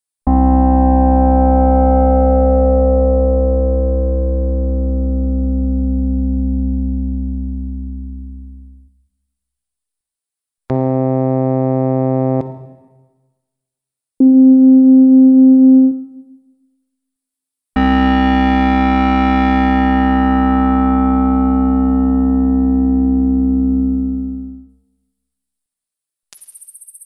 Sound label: Tape